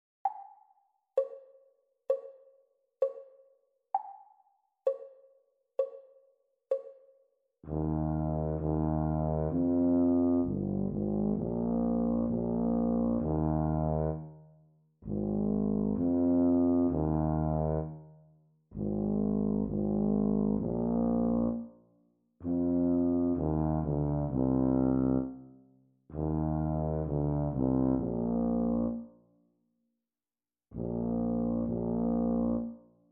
Bb tuba duet sea song exam speed; Grade 3

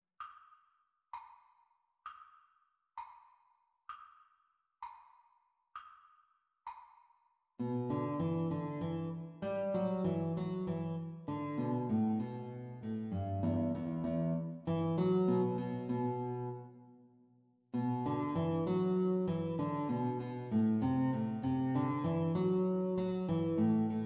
Guitar Duet Playalong Practice Speed

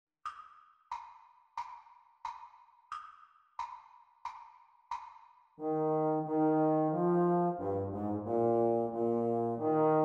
Playalong for Trombone, Speed; Exam Speed

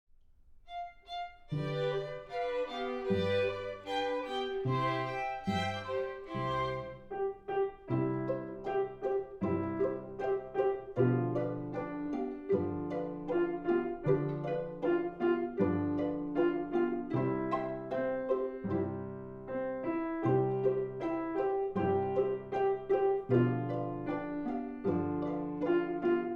with piano

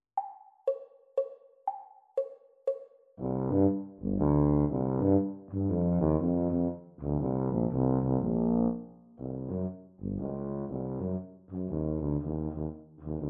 Bb Tuba Duet; Grade 6; exam speed

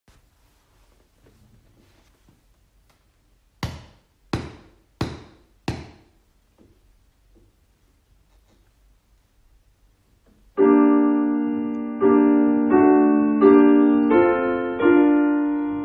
piano duet pavane exam speed; Grade 6

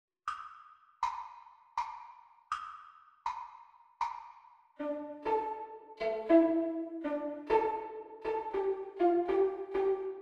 Violin Duet Practice Speed

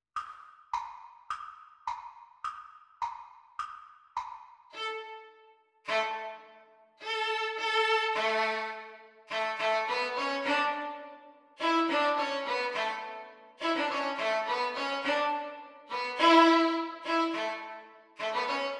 Violin Duet Exam Speed

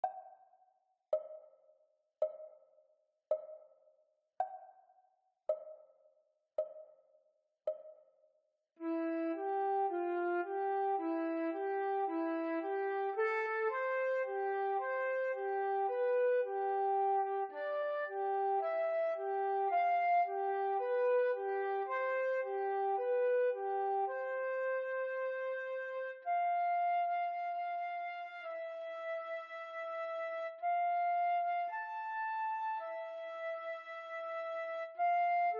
Flute Practise Speed; Grade 2

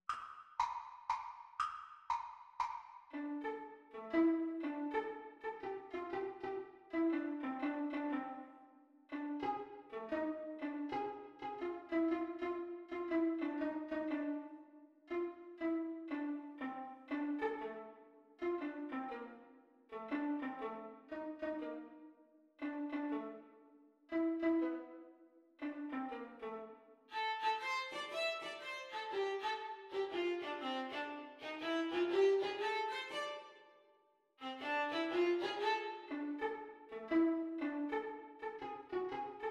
Viola Duet Playalong Exam Speed

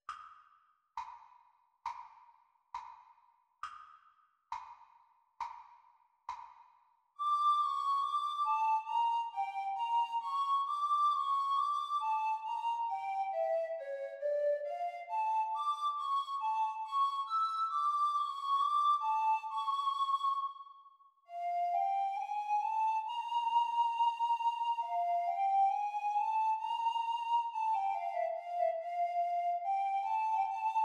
Descant Recorder Practise speed

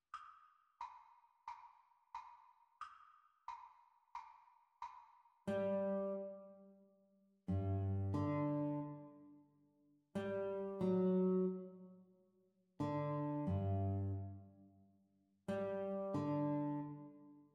Guitar Duet Playalong Exam Speed

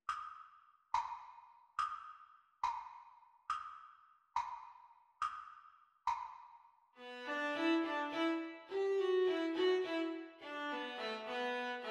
Viola Duet Playalong Practice Speed

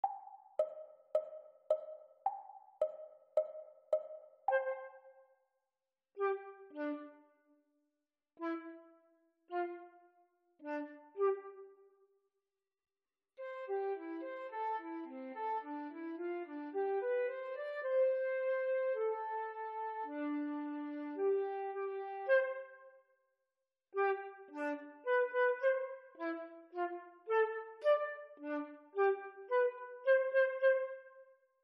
Flute Duet; Grade 1; Exam Speed

